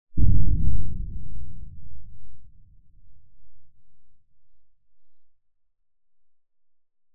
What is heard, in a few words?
explosion distant large